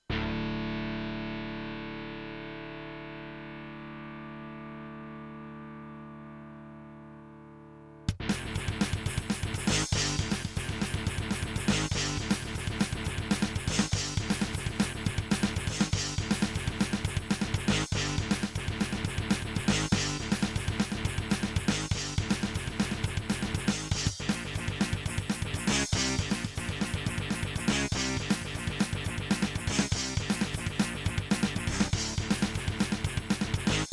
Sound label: aztech sg wr pro32 ii; Soundcard test page; AZTech SoundGalaxy Waverider pro 32-3d(i); doom